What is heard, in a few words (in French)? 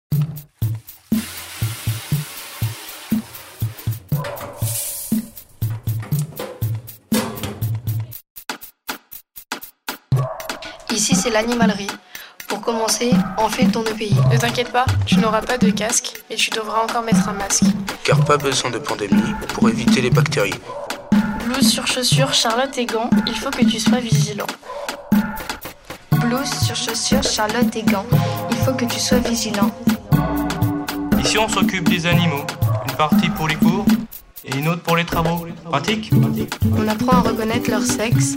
Musique; Animalerie